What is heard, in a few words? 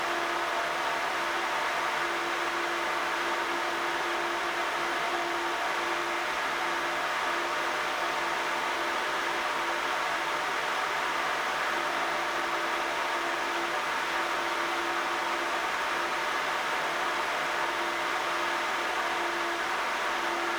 XPG Nidec Vento Pro 120 PWM 100 Fan Speed